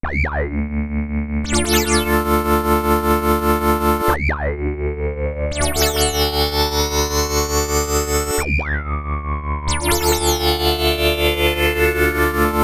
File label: chipmunks choir; ambika